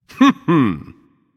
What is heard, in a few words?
heh heh; smite; achilles